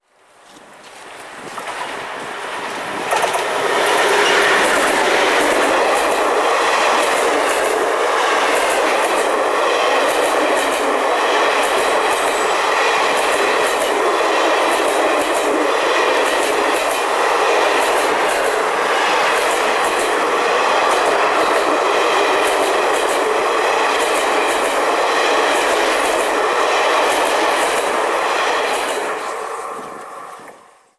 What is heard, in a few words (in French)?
bruit joints de rails; sonotheque